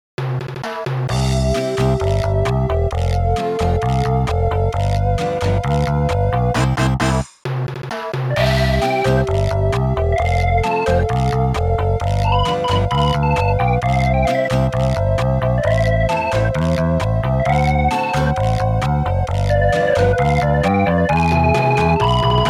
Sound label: ghost music